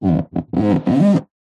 surfboard epoxy resin handle low pitched squeak creak; sport; zapsplat